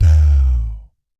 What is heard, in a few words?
"DOW" D2, vocal jazz fx; Spectrasonics; Vocal Planet Vol.3 Jazz Fx